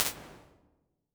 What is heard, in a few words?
Varoom, Reverb; Big Gee's